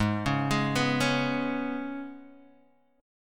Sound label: Ab Minor Double Flat 5th, down, Guitar, Open D